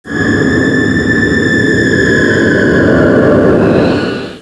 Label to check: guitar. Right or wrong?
Wrong